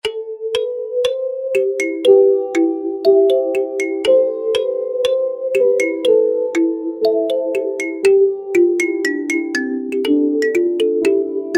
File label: Kalimba